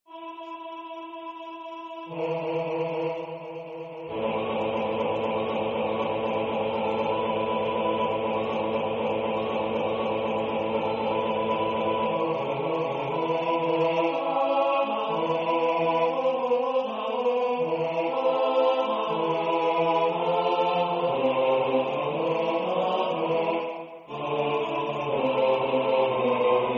bas; Killebukken

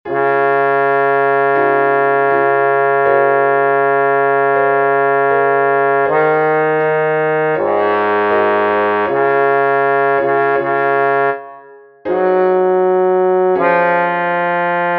Bas, koor; D.Bortnianski; Du HIrte Israels

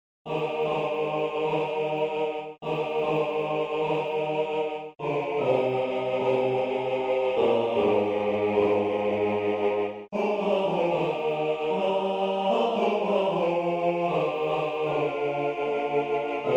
Bas 1; E.Elgar; As torrents in summer

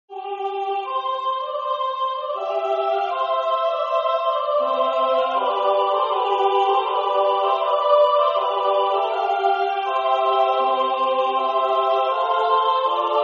vrouwenkoor Tutti; H.Purcell

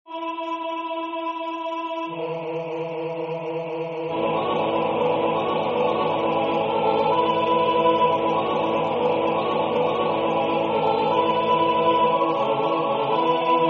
Tutti, koor; Killebukken

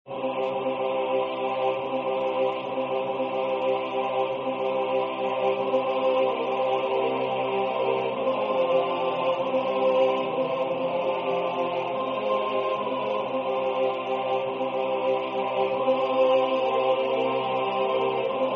tutti; Lokkeleg

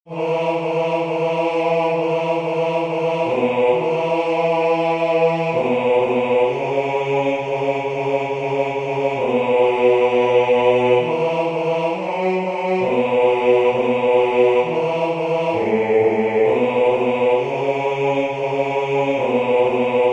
Bas; Notre Pere

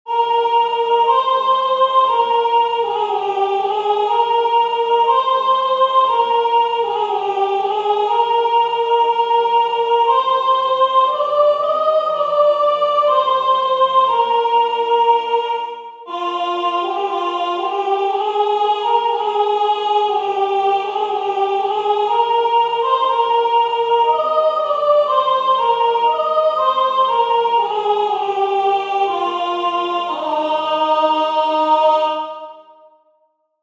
Sopr.; O Du Frohliche

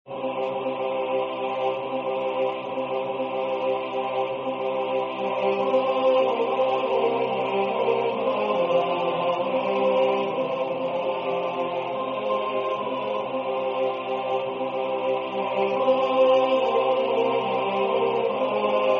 tenorsolo; Lokkeleg

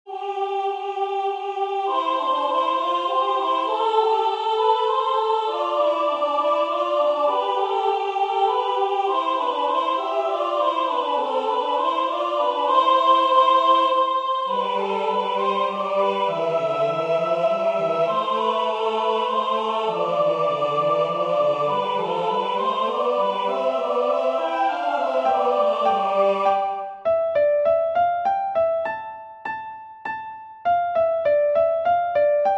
Tutti; J.S.Bach; Ich will den Namen Gottes loben

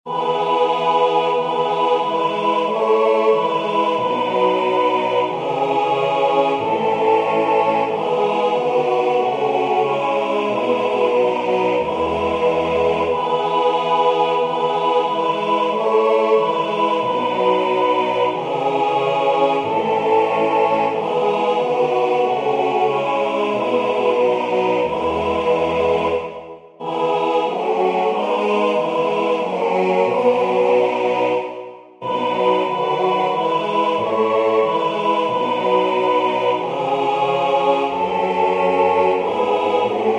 Tutti, koor; M.Praetorius; Es ist ein Ros entsprungen

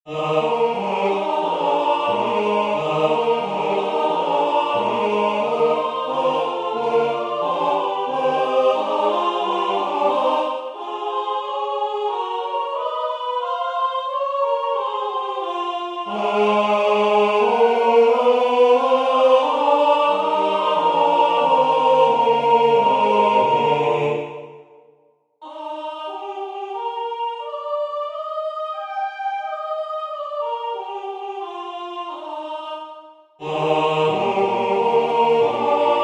Tenor, koor; A.Dvorak; V Dobrym sme se sesli

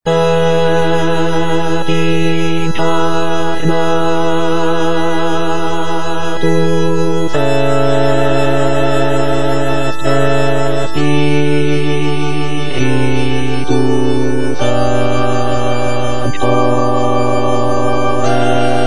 Adagio Bas, koor; A.Vivaldi; Credo

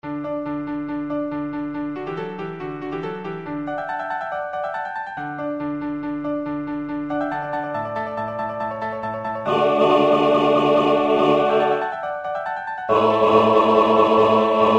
Tutti, koor; A.Vivaldi; 11.Quoniam tu solos sanctus

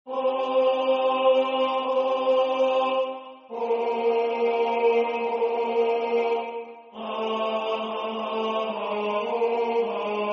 bas 1; Stemning

